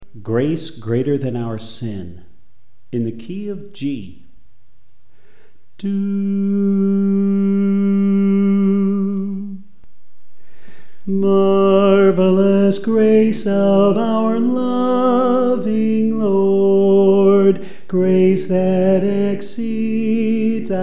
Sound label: Soprano